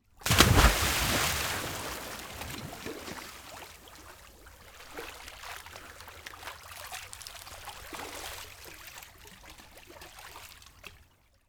ONE SWIMMER DIVE HARD IN WATER; Red Libraries

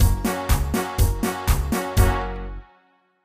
Polka ident; Music Loops; Kenney's Sound Pack; Idents

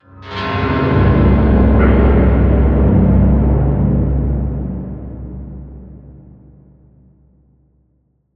Industrial Terror - Hits & Transitions

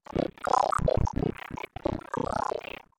Alien Beast Small - Short; Gage Freeman; Alien Voices

